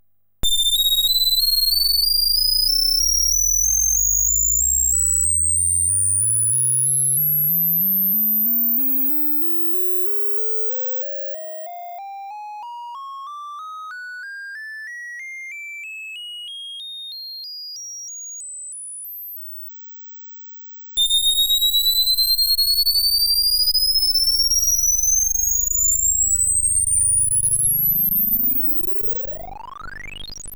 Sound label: pokey triangle; pokeymax